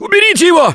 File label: scream while pounced; Gambler; russian